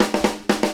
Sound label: FILL, Grooves Filz Hitz; PlatinumPhattCD1; E-MU Producer Series Vol. 8 – Platinum Phatt